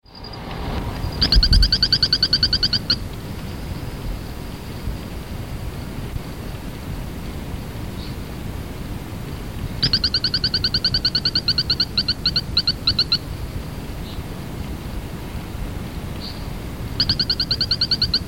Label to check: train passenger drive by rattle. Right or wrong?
Wrong